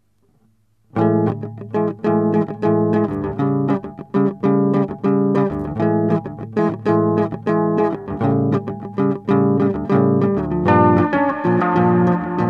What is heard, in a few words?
jazz